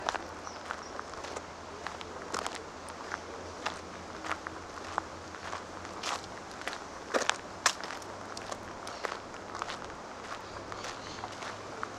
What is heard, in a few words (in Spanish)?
Parque pasos; ParqueTierno